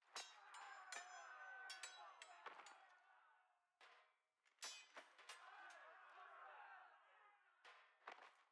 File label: amb building barracks; demacia rising